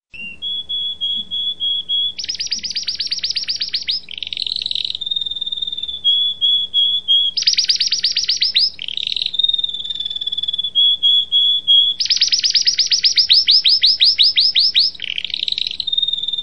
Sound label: canary singing